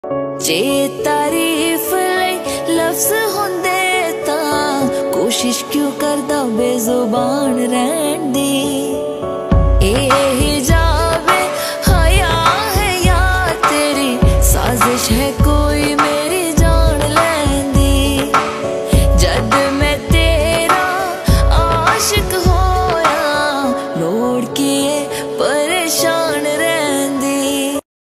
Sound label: female punjabi ringtone